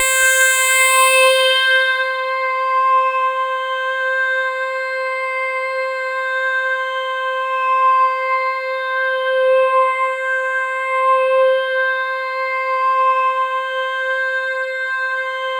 C5, Roland JV 2080 TECHNO PAD

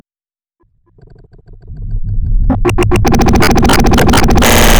Glitch

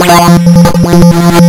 lsdj waves; wavetables

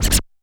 Scratch Push, Standard Kit, Yamaha PSR-E323

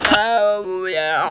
"Ohyaa", Funky Vocals; USB Soundscan vol.18; TB MELODY

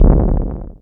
CRACK FX

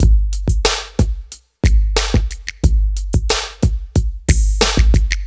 DRM, Hip Hop and R&B Drumloops

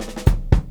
FILL

